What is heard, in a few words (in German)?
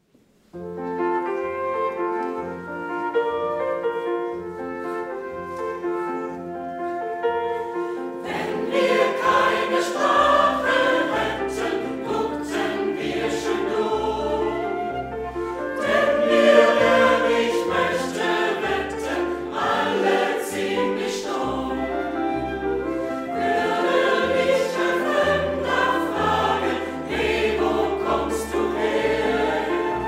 Chor Lied; Wenn wir keine Sprache hätten